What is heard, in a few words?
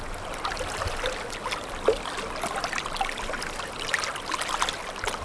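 light waves loop, docks; soundscapes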